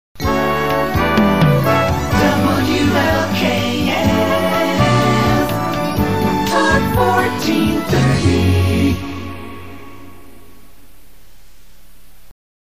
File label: jingle; WLKF; WLKF Talk 1430